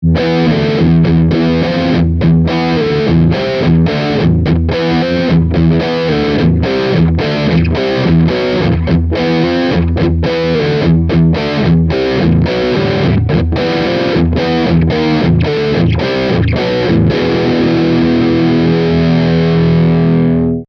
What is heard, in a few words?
bridge riff distortion, yamaha pacifica 112v